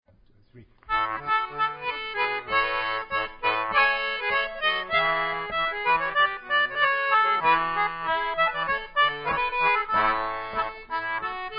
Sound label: Concertina Choir Madrigal; 2009 Concert; Morley; Now Is The Month Of Maying